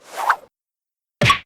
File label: cane hit; bonny